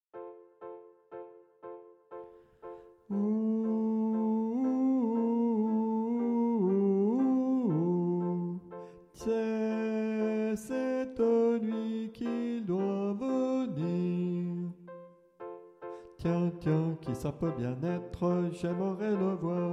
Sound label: bas